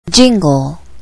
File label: jingle